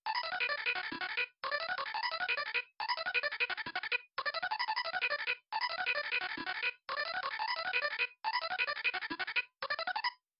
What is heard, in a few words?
Mellotron Reverse Before; RMX16; neve